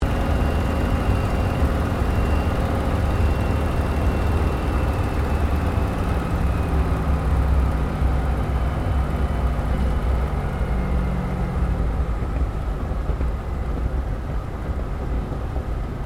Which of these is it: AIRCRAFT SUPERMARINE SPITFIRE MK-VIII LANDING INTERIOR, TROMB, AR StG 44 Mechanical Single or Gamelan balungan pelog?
AIRCRAFT SUPERMARINE SPITFIRE MK-VIII LANDING INTERIOR